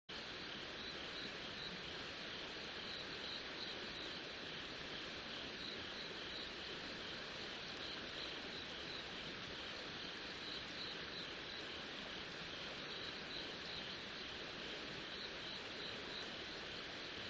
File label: riverside; Kyoto U; Ashiu